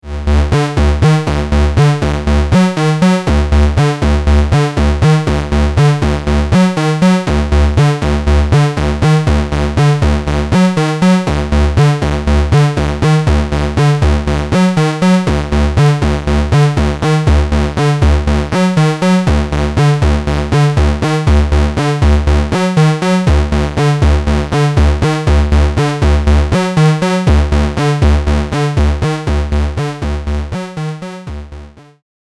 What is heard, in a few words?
Passive DI Pre Amp Tube